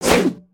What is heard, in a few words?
Staff Spinjitzu; weapons; aronwk collection; ndaudio